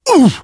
hurt minor, survivor voice, manager

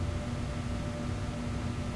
tone; tf; Source Engine; video games; ambient; lair

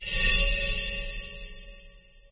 amb random tech; source engine; portal2

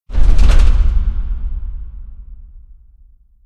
train rattle; video games; source engine; portal2

